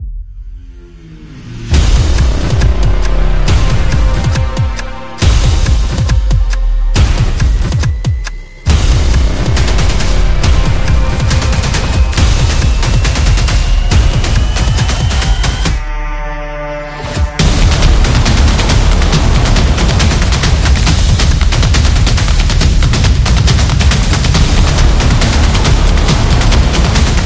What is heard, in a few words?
A Sport Opener; samba